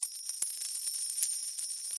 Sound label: small Win Loop; eggomatic